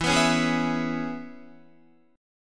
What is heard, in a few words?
E 6th E, Guitar; strummed